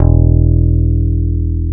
FEND P-BASS E1; Sonic Reality - Vintage Timetraveler

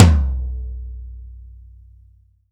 Komp Tom, Percussion Hits; Zero-G; Soundclash; Acidized Wavs

